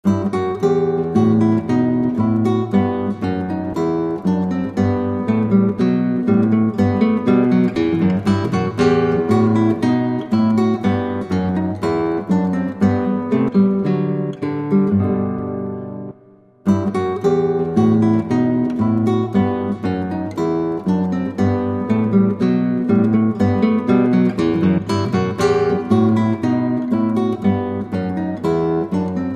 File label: Bourrée; Bach; adelita